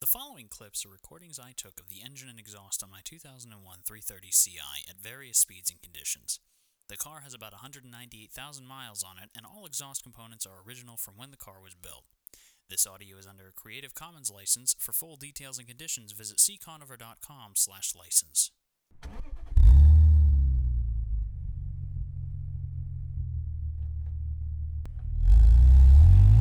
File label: BMW M54 sound